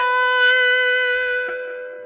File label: distorto strum, Guitar; Notes